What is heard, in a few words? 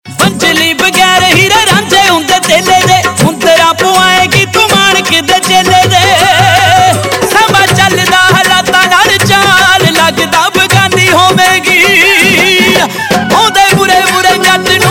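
Ringtone, Punjabi Pop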